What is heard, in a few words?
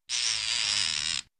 plastic stretches